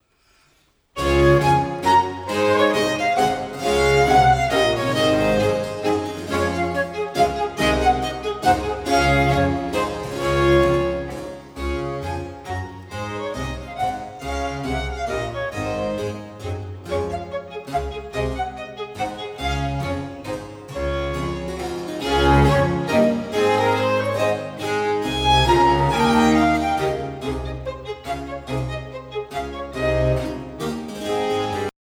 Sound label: Flute Concerto in D Major, Menuet; TWV Anh. 51 D